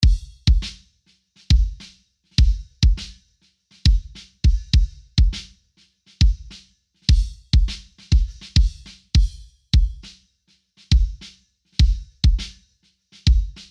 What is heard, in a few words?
kick after